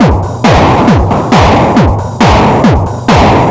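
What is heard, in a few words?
mongolian cavalry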